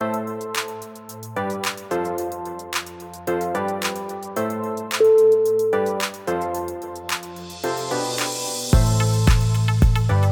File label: lounge